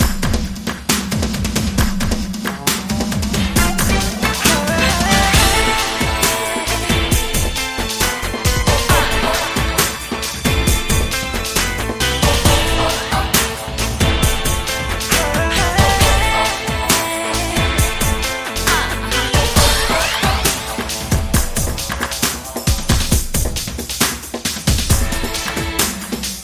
Beat Build Mix

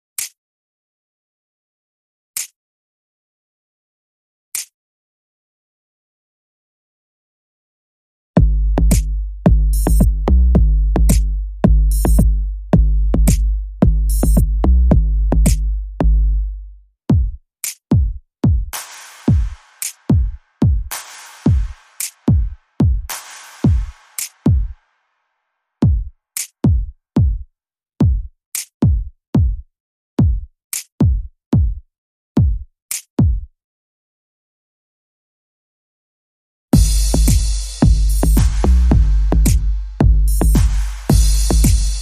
Drums

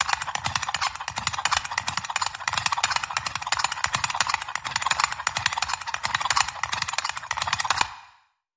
Toyland Engine Windup

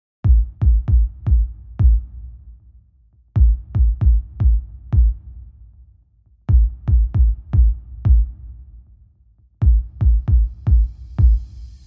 Drums and Basses

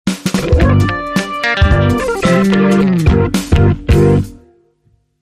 Funky Organ Hammond

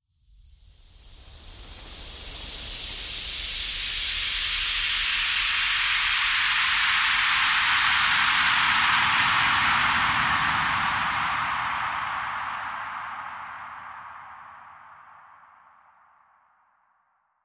Light Sweep

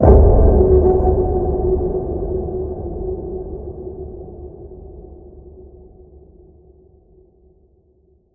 Deep Waters Ping

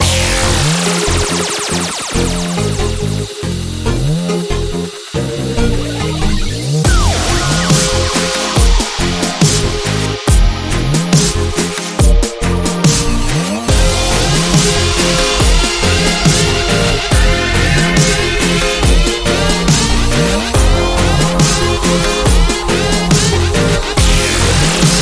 Corporate Cool

